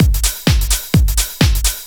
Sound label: Clubhouse Loop 128 BPM